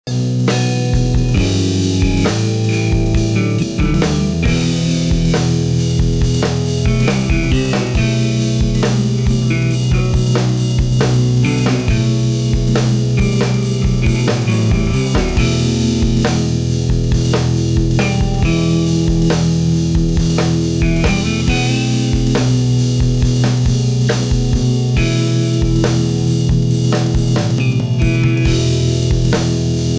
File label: grunge with rock and jazz